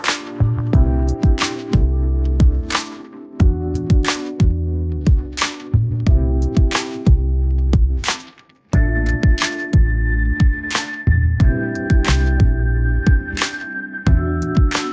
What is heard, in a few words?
lo fi hip hop